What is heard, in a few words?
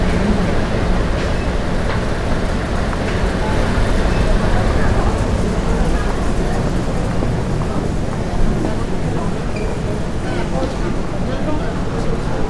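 walking street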